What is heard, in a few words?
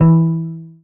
marimba guitar; ゆめ2っき